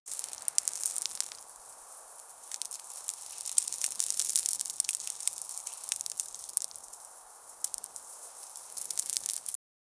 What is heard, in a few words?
soft dry earth crumbling; outdoors